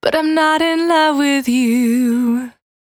Cry 68bpm B, Vocal; Zero-G

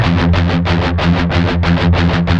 16TH F#2 R, Guitars; Spectrasonics; Hans Zimmer Guitars VOL-1; MED 16TH

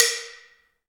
AMB SLIT, LOG DRUMS; Heart of Africa

